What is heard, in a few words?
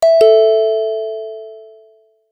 door bell sound